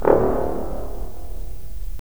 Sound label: BRS, Roland LA Composer